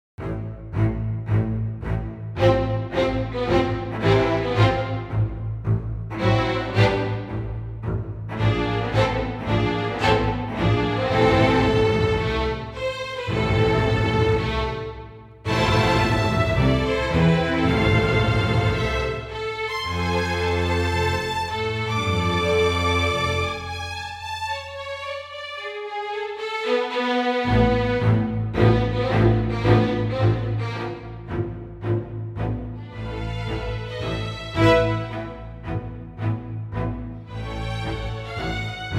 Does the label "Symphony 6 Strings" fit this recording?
Yes